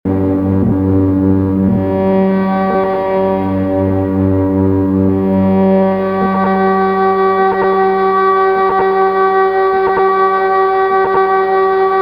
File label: mutant cello